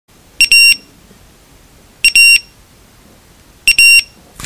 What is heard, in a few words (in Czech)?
pipani